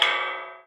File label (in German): metallklang; klang im schnee